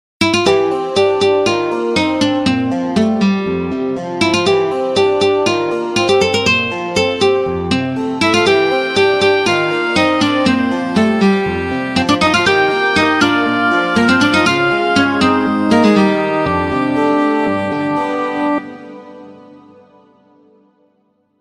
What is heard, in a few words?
guitar ringtones